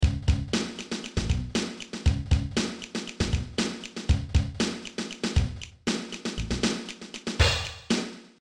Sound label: break beat waa